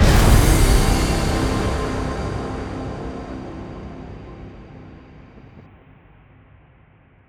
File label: Big Win End; netent